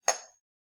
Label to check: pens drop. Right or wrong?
Right